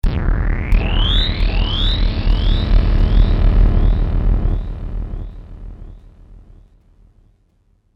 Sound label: Synth, Stab, Danger, Ghostly; Stabs; BlastWave